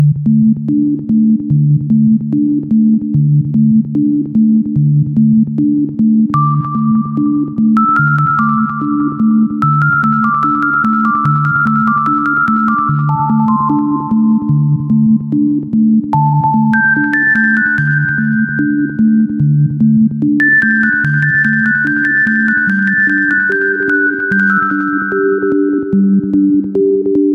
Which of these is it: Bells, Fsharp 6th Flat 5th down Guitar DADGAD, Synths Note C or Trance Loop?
Trance Loop